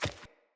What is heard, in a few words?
Pack Wolf Step Single; Animals; Footsteps